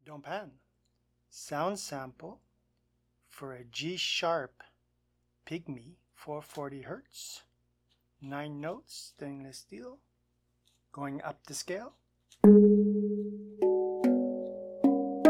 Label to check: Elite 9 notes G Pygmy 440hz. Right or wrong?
Right